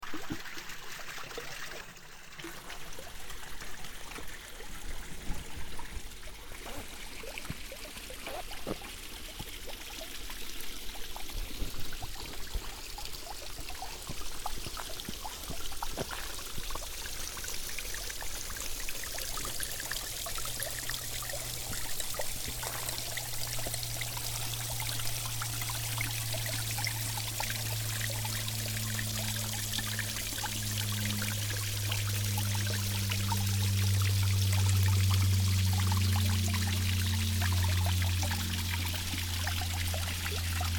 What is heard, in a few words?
Rainmaking, Joshua Tree